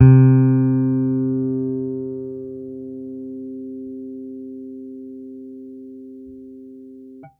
String Bass Electric c3 soft

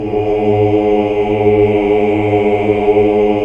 OOH, OOOH Uni; Sound & Vision - Gigapack II CD 1 (Roland)

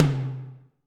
Tom Mid Dbl; Alesis D4

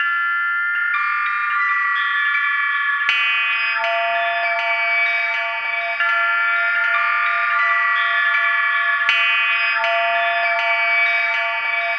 Slide Guitar

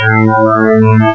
SWEEP; CMI Sound Library Rev 1.3

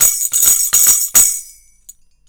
CYMB, Indian Traditions; USB Soundscan vol.45; AKAI

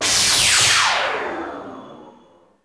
BLAST IT, LASERS STABS; ILIO; Trance Fusion

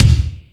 Lotsa Kicks, Drum Machines

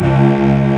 F#1, STRGS; East West; Global Sounds

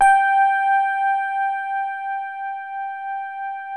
DELICATE, DX RHODES

